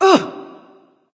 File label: surprised; smite; terra